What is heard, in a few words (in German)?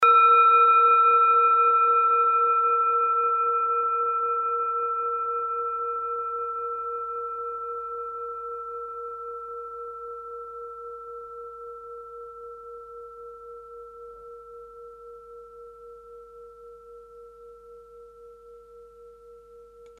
kleine klangschale